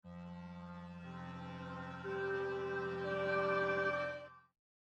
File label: Pad